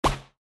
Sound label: Popper; Fancy Pants World 1 Remix